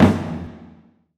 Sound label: right accent, alfaia